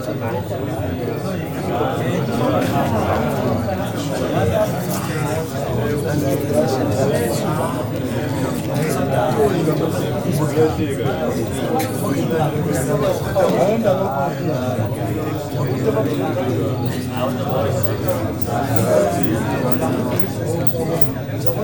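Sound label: AFRICAN CROWD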